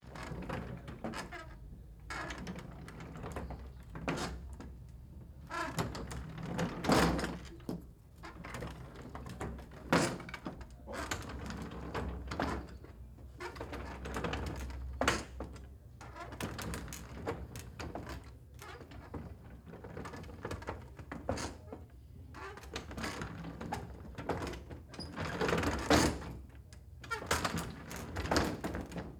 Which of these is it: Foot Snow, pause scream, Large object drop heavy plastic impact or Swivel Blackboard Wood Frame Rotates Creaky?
Swivel Blackboard Wood Frame Rotates Creaky